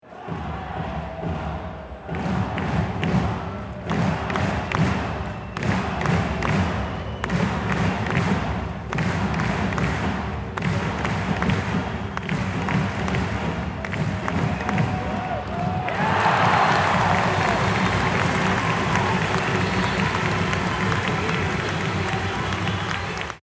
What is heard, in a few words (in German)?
Handball Hallen Spiel Atmosphaere